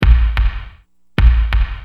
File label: Hard, Drums Perc; Industrial